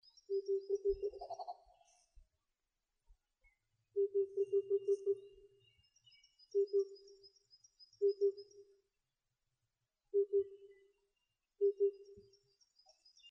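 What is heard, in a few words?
oriental cuckoo call; tutudori nakigoe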